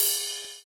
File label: Ride; Equipped Music; Loungin House